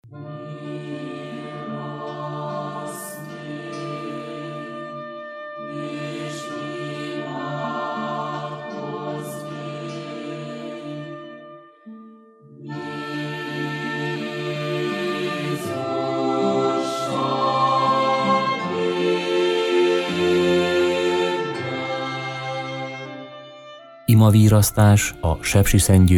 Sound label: Jingle; Virrasztas az Urral; Szentgyorgy